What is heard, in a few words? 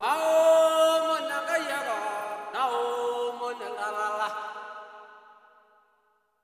SOLO G#, Heart of Africa; Spectrasonics; Heart of Africa 2